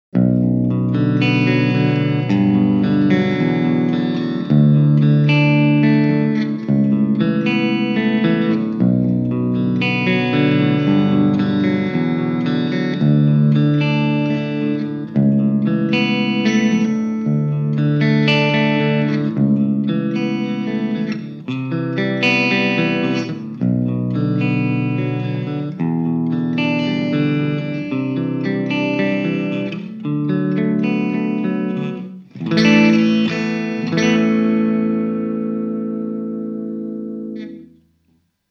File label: Clean